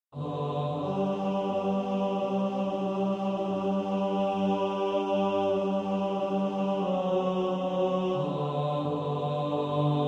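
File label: tenor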